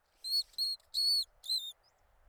Spermophilus erythrogenys

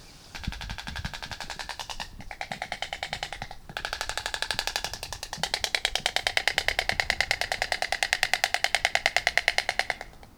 Ciconia ciconia click

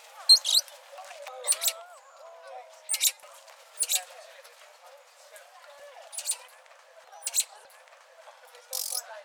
Saimiri boliviensis

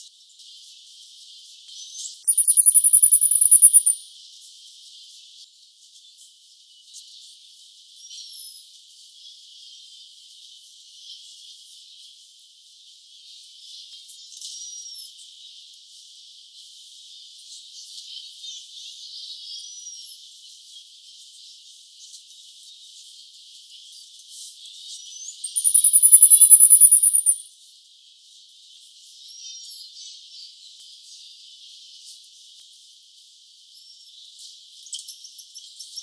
Callimico goeldii

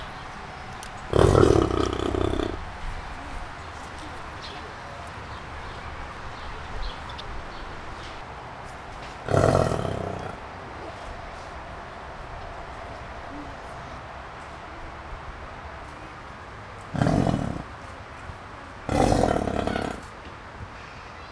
Bison bonasus female

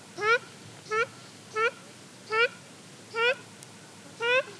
Tadorna ferruginea male

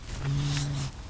Grus leucogeranus growl

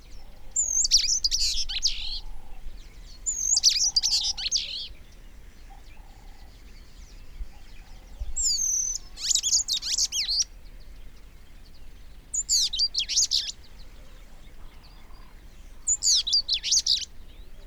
Vidua regia

